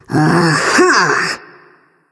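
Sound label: nonverbal agree; smite; ratatoskr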